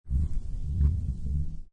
Billiard Pool Snooker Close Up Sound Of Ball Rollling On Felt